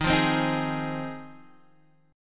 G sharp 6th D sharp, chord

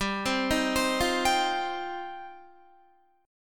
G 7th Suspended 4th Sharp 5th, Guitar

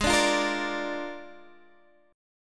Bb 7th Ab

